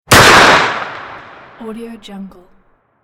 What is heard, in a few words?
pistol shot